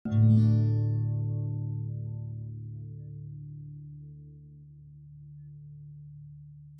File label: d modal chord, dadgad